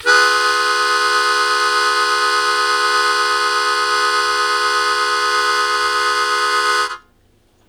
Blues Chord Attack C#1, Harmonica; Zero G - Harmonica

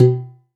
CHIN MUTE, KOREAN PER; AKAI S-Series CD-ROM Sound Library VOL-5

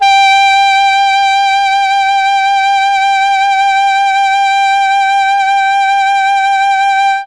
G3 ALTO SAX, Mellotron; Mike Pinder - Mellotron Archive CD; CHMB ALTOSAX

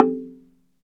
Slit Drums, Africa, Wood & Metal; Roland LCDP11 Africa VOL-1

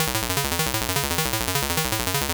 70s Random 102 C#, 70s Sequencer Synth Lines; Zero-G Kocktail Kollection

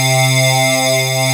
SYN, analog

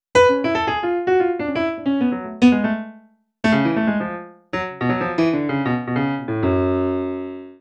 Piano Solo, Cinematic Funk; Sony Creative Software; Urban Tone Poems

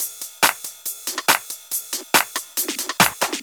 drum Loop 140BPM Full

